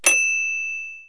end line, type, office; Kaboom! Junior

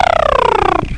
tongue out; Acorn on the Moon; Langues

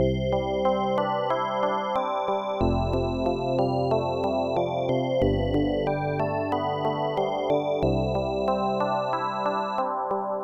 ballad

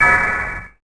Percu

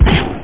plasma hit

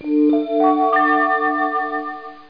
Friendly; Guy Friday 1.22

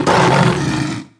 mau hit; DAoC; Toa; Gamestar

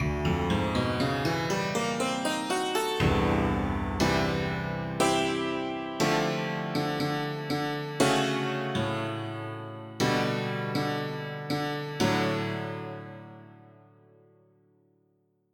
Hammered Dulcimer

